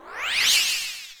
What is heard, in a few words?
Kamek Fly Out; New Super Mario Bros. Wii